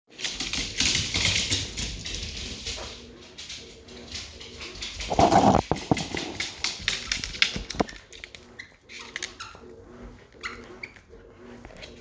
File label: clickety clickety